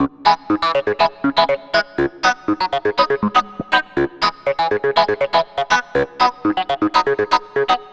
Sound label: Clavinet; Keys; Dr. Fink's Funk Factory